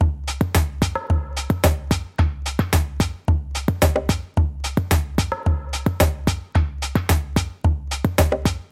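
TOP LOOPS, Organic House / Deep House Sample Pack Oriental & Middle Eastern; Azura – Organic House Sample Pack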